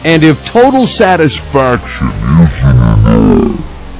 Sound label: die; svencoop; cracklife; agrunt